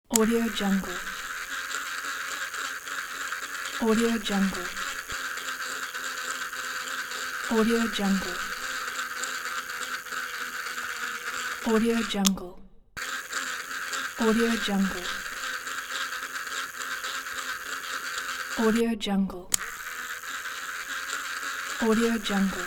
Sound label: sound of mechanical toy